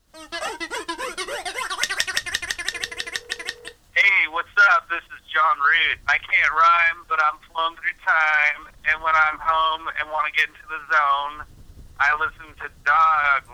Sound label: Cell phone drop